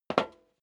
step leather shoes metal dry single stair down

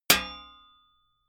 sheet ring

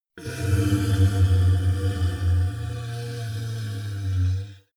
monster inhale low; kreaturen

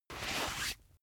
boxing gloves slip off; sport freizeit; boxen

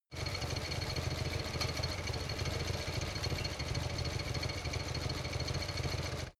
motorcycle harley engine idle rattle

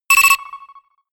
notification pager; kommunikation; signale

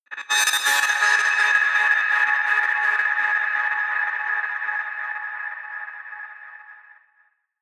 stutter breach high, drones

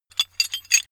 metal handling pieces

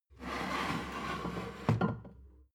plate stack slide table, gastronomie; soundpool

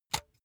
razor retract comb; soundpool

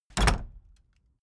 case lock close; objekte; tueren

